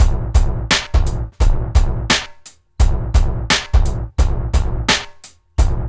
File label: DRUMS, Loops; East West; Funky Ass Loops